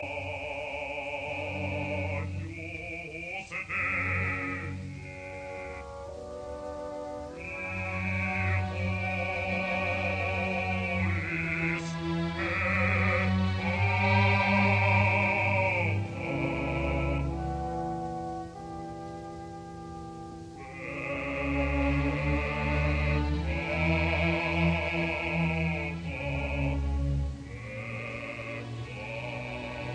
Mass in D major, Agnus Dei; Op 123; Missa Solemnis; Arturo Toscanini

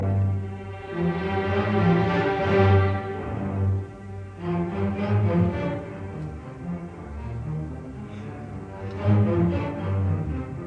Symphony in C minor Allegro maestoso; Symphony No 2; Resurrection; Bruno Walter

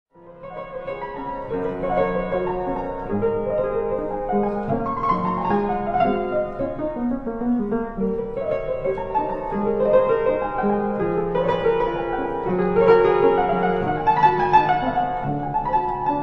Etudes for piano, F sharp major

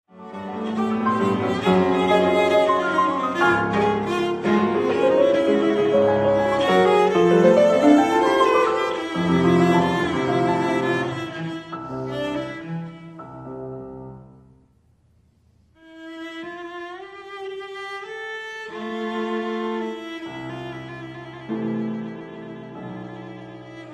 Sonata for cello & piano, Aria

